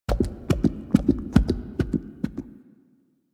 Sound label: Infested Burst Rifle Heart Beat; Warframe